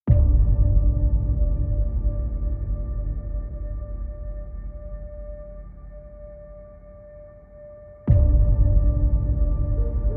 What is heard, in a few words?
total war epic action cinematic trailer